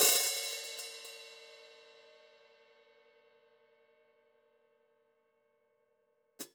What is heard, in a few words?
Hats Open, drum